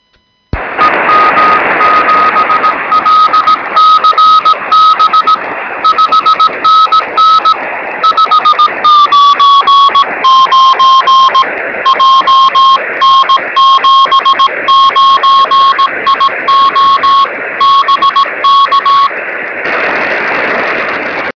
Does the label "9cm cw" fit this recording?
Yes